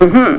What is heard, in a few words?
hmm